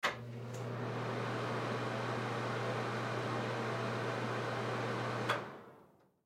Hotel Room Fan, Foley Hotel Room Bathroom Fan Switch On Run Off Short; Blastwave